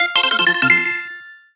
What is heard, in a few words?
Stylish Move